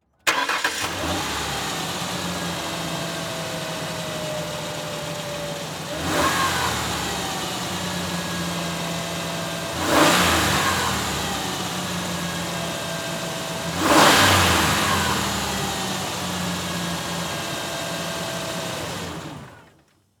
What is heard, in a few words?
Truck Ford Ranger Start Idle Rev Off Mic In Front Short; transportation